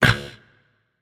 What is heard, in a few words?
notice; smite; achilles